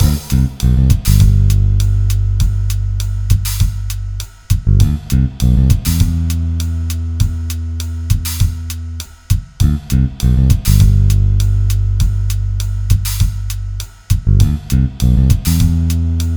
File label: Pause Menu; Friday Night Funkin' The Cat Boyfriend Show